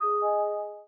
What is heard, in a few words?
Lock Pure; LG Wing 5G SKT